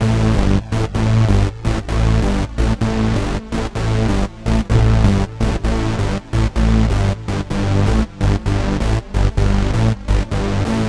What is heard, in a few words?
Hard Saw Bass, EDM; Sonic Foundry (Sony Creative Software); Afterhours EDM